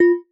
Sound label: marimba glasses; ゆめ2っき